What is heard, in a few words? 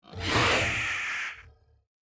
energy being; stellaris; Necroid portrait